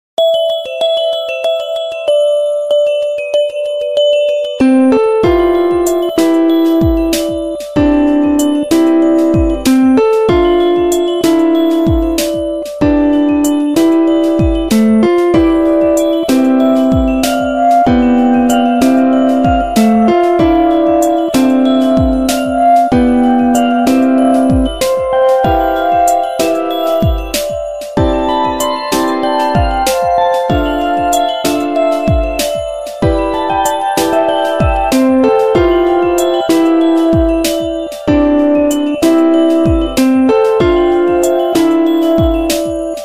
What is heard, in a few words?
Love Background Music Ringtone; Share Chat